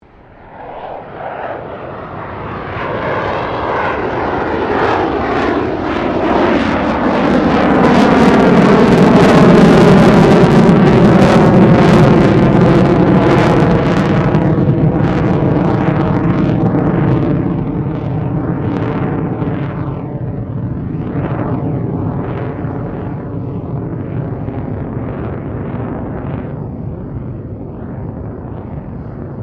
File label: AIRCRAFT F16 FALCON PASS SLOW; Airplanes (Sound Effects:Vehicles)